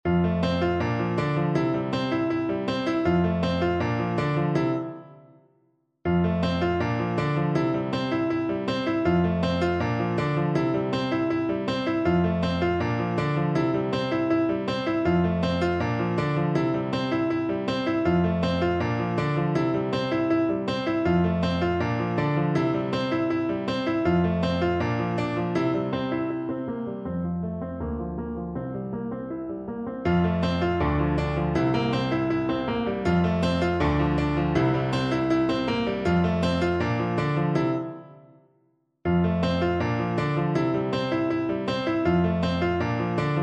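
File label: la bamba, xylophone, Percussion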